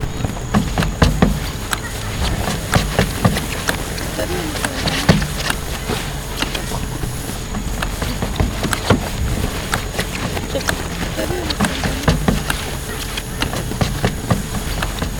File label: soundscape, Location: Bridge next to the school; The neighborhood as a concert hall; This collection of sound compositions was created by the Secondary 1 students from John Dewey College (The Hague)